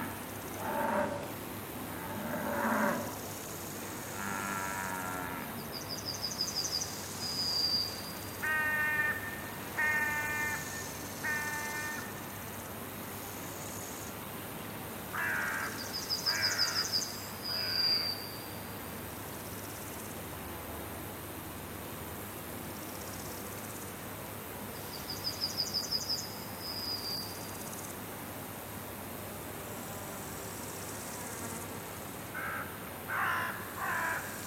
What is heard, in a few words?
countryside, ambience; isger; pf2e; hellbreakers